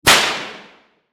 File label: Whip Crack Bullwhip Impact Snap Reverb; Warfare; Blastwave